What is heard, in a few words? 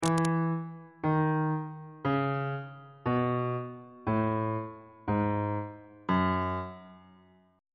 g flat dorian mode descending